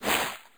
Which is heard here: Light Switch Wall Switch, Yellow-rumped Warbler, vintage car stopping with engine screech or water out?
water out